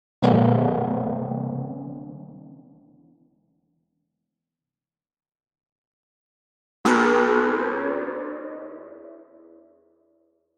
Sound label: Boings, Multimedia Comic Boings.; Cartoons